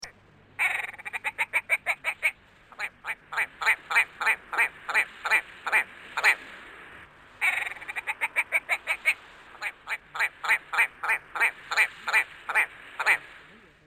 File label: red grouse